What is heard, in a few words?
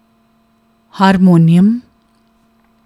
harmonium